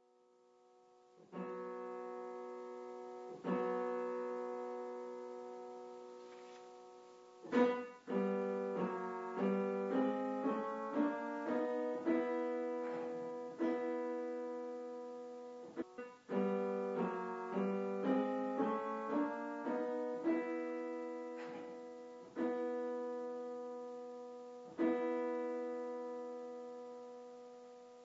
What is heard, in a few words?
tuning